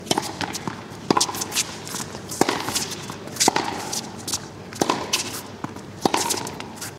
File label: Six Rally Shots, Tennis Balls; JC Audio; Tennis Balls And Ambience